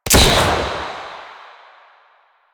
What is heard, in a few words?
Epitaph Prime Shot B, Weapons Sidearm; Warframe; Tenno; Wraith Sidearm